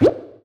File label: menu blow; diver jimmy